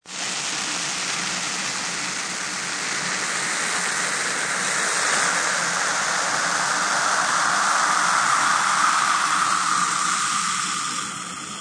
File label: Champagne Fizz, Extremely upclose recording of champagne fizzing in glass